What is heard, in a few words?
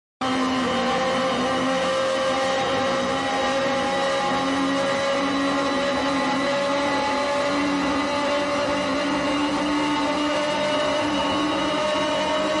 Violence Rise Only; Ninja Tracks